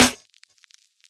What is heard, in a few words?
snare; dough-samples; eddyflux